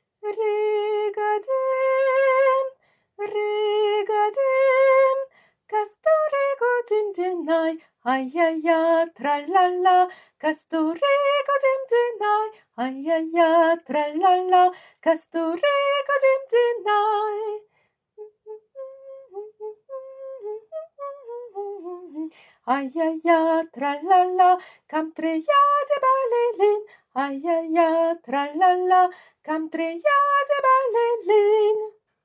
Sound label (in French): Riga soprano